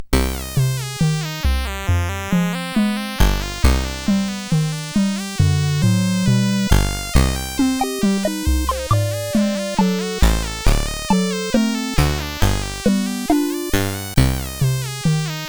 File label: Test Tie Notes, CSG8580R5; Driver 11